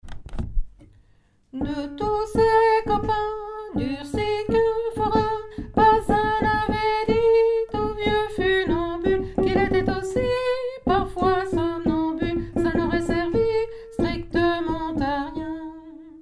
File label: soprano